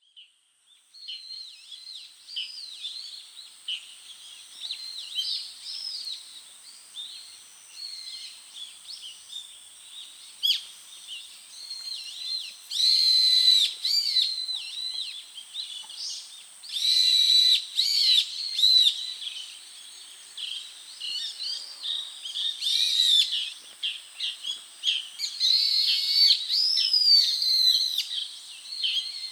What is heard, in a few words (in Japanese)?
茨城県戸頭でのインコ、スズメ、ムクドリなどの野鳥の声です。; 2025年10月下旬に、茨城県戸頭でのインコ、スズメ、ムクドリなどの野鳥の声です。